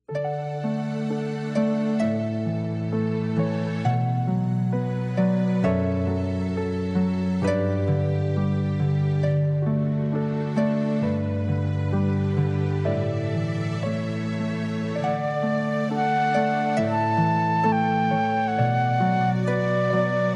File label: pachbel canon d